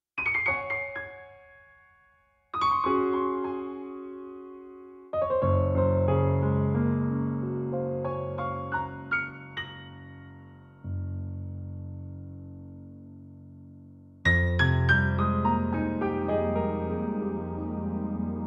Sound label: Piano; Fantom XR